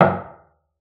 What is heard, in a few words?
CELLO FINGER; 90 s Sample CDs; Xsample VOL-5